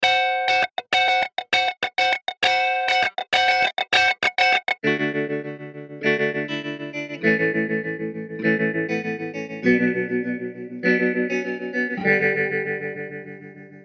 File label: Guitar